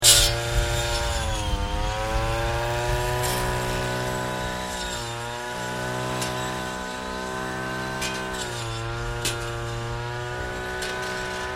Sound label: Whipper Snipper Metal Disk Operating Loop; Hedge trimmer; Yard Tools (Sound Effects:Tools); SoundEffects-Foundation4